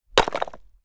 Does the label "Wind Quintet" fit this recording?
No